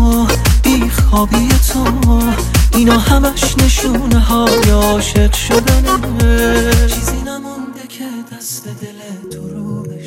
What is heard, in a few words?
Remix